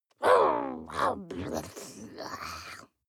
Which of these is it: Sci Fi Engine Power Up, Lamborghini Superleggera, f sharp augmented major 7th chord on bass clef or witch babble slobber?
witch babble slobber